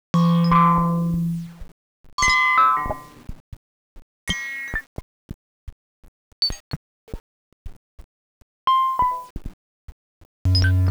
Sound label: hose